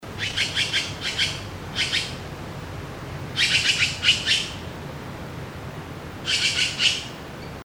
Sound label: Formosan Magpie, birdcall; Urocissa caerulea; Bird Call Recordings